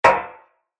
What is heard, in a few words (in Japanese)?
足音 金属 単音 二歩目; モノラル